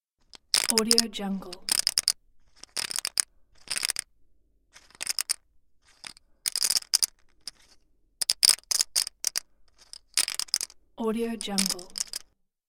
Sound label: poker gambling chips shuffle